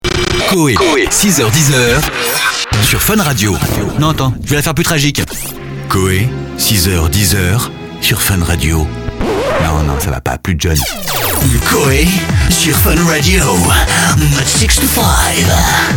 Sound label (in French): Jingle Fun Radio Rentrée